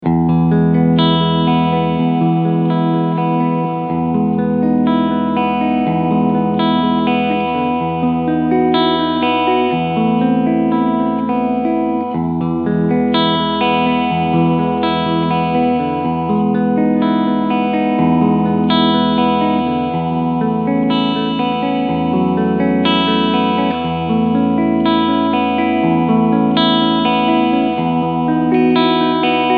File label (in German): Deluxe Atlantic Cleansound beide PUs SC Modus; DAngelico